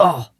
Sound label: Little Fighter Guy - Vocalizations - Crash Bump Fall